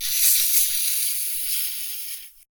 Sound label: die, aliens; bloodspider